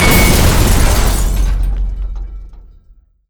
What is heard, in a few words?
Impact Truck Big; Flatout 4 Total Insanity